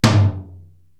Tom Lo, Korg TR-Rack

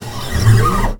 SLIDE A2, BASS FINGER; Xsample VOL-5

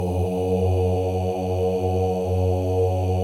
male ohs; Spectrasonics; Symphony of Voices

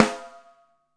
velo rim; Back In Time Records; Big Bang; THE ROCK

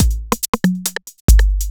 808 Loop, Drums Beats

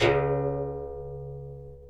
BIRIM NOR PP, BRAZIL

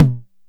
Bass Tom, Jomox Airbase99

